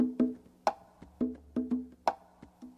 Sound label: Drum loops; Just Add Drums